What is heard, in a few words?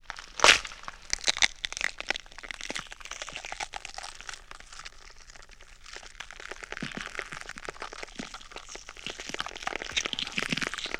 Bone Crunch & Snap, Hand To Hand Combat